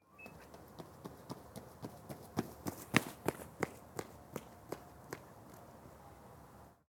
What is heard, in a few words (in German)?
laufen